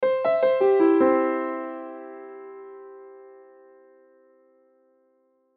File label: Piano Melody Major; Musical; Pianos; Blastwave; Sound Effects:Musical